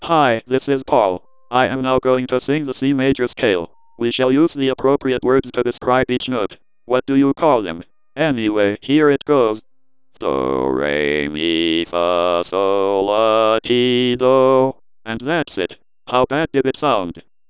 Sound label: c major, DECtalk